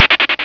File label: skitter